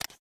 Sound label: shutter mirror down; LG X power 3